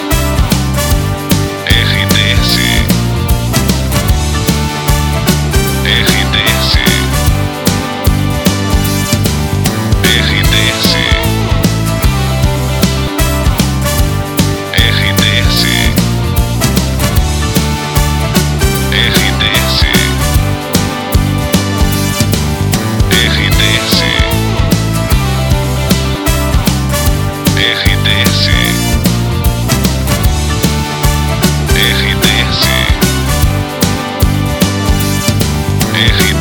trilha para locução; Oeste

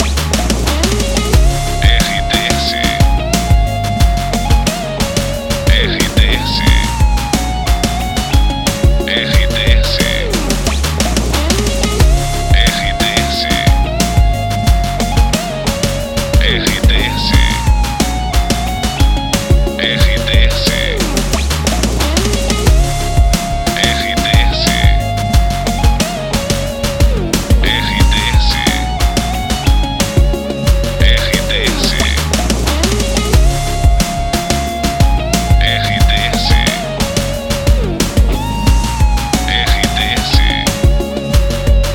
TRILHA PARA LOCUCAO; Adore Mais FM

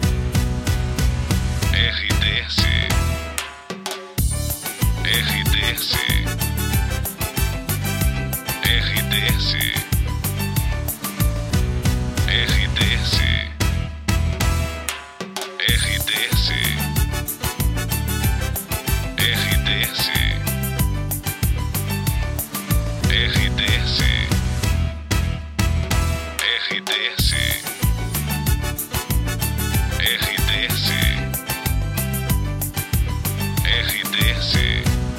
trilha para locução; Rádio Panorâmica FM de Campina Grande, Paraíba, Brasil

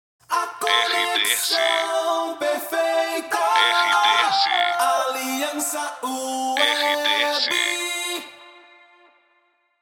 ACAPELA; Aliança Web

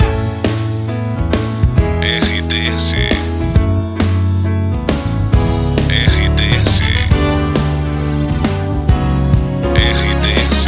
trilha para locução; Prata FM 2021

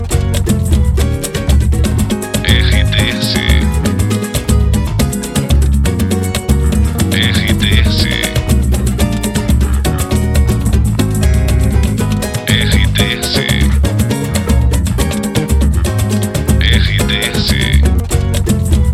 trilha para locução; Sam Brasil; Itapoan FM 2020

